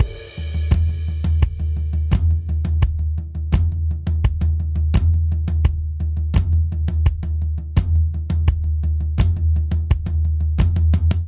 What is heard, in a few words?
Toms Pop Verse 85 bpm; 4 4; MIDI Clips; Ableton; Factory Packs; Drum Booth